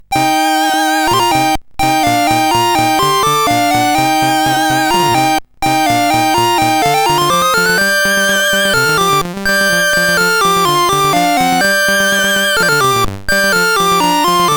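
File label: Operation Hormuz, MOS 8580 SID chip music, games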